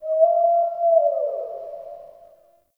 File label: Forest Open Glade Animal Far, Day; Ambience